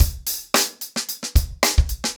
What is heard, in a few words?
110BPM, acoustic drums; The Roots Drumloops